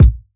kick sound a sharp key, steel kick drum sample tuned to the A# note. Loudest frequency: 321Hz; kick drum samples